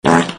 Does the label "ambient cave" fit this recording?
Yes